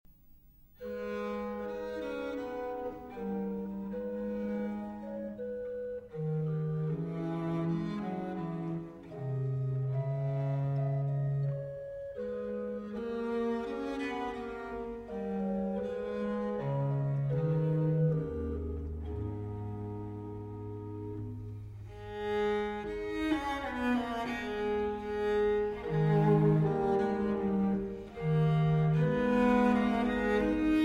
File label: Cello Sonata in A Minor, Largo; Vivaldi; RV 44; 1. Largo